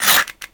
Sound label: claw scrape